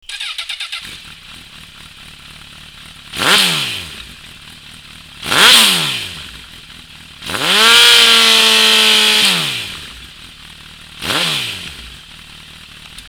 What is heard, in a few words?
CBR1000RR RT-1 Aluminum SlipOn Street, exhaust sound; honda; Jardine